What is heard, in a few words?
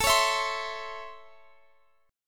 Db Minor Major 7th Sharp 5th A